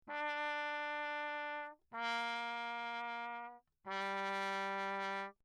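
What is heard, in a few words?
trumpet mute Lechner Bach low; Perinétventiltrompete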